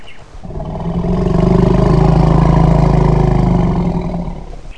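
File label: elephant call; onsafari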